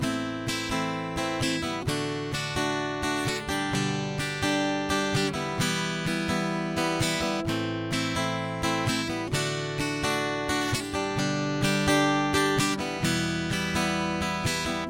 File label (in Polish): aDGe w petli, gitara